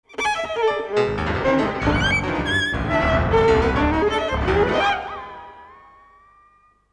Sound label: Vln Syn